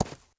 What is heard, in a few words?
horse footstep grass hard; Rust